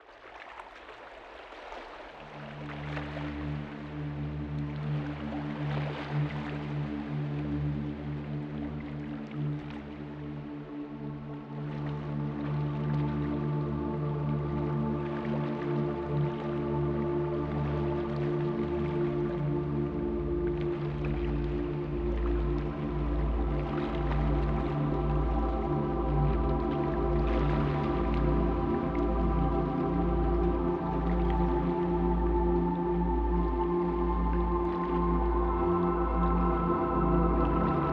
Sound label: Binaural Beats Brain Waves Isochronic Tones Classical New Age Piano